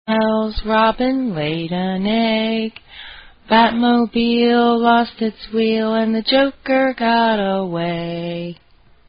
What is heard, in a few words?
Jingle Bells, Pitched Shifted; Batman Smells